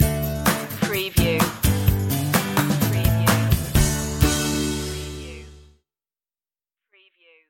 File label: lucky stinger